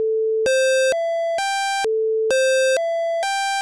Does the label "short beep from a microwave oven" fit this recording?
No